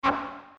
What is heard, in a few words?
STAB, One-Shot Synths; Crowdsourced Sample Pack; TRUTH-HZ